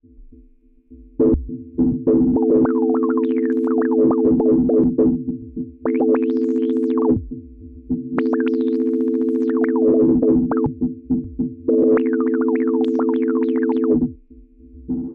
Waldorf 2 Pole Sine Pad Gate Trigger Runner

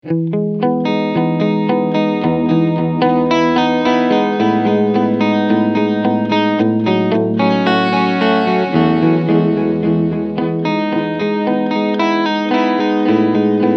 Medium Delay Clean

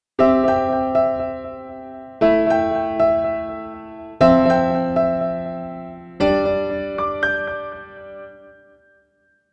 Korg SDD 3000 Piano Perfect Piano; UAD

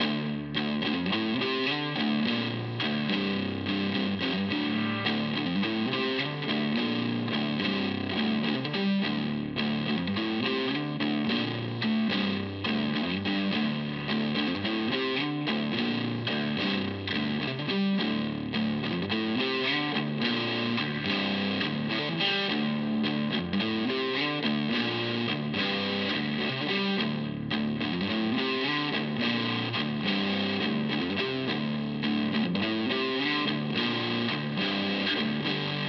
Headrush MX5 Amp White Boost

